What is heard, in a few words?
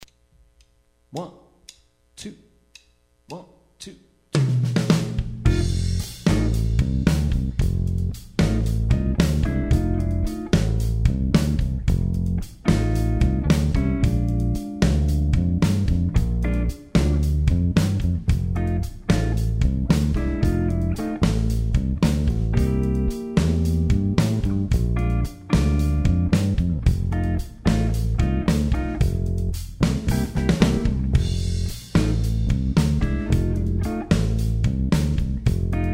solo jam track, blues; EBS